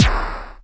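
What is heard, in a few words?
Pit Snare; Quasimidi Rave-o-lution 309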